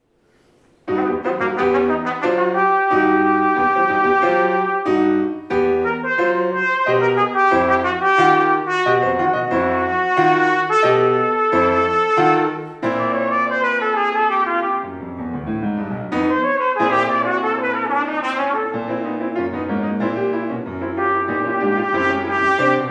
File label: trb piano; chamber